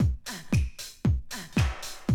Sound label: Classic Drum Loops